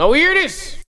VOCALS; Ueberschall; Jam Box

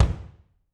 BD, Drums; Bob Clearmountain Drums I

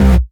Basses Root C

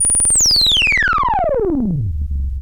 Monotron Sci-Fi

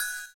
CYM, Ethno Techno; ILIO - Ethno Techno

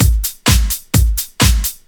Straight Loop 128 BPM, House

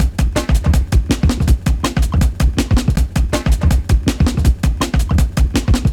162, breaks, Loops; M8

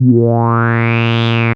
a#, Analogue FX, Dance Synths

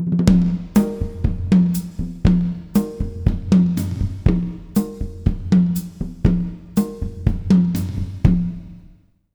120 W side, jazz latin drumloops; USB Soundscan vol.08; AKAI

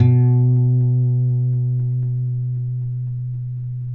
Finger B 2 R, Guitars; Spectrasonics; Hans Zimmer Guitars VOL-1

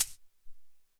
Shaker, Alesis SR-18 Drum Machines; 300 Drum Machines

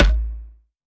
Percussions

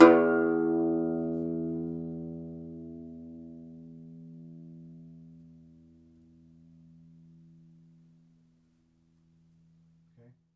DOMRA, Segundo Domra, Plucked Strings

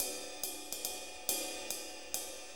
6-8 Ride, HiHat & Ride Loops

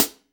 HH CL, Drum 1 Dry; East Collexion

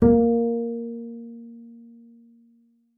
PIZM A#3, bass pizz; Xsample VOL-5

